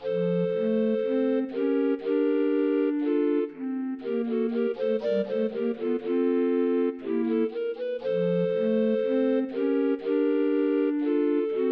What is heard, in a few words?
recorder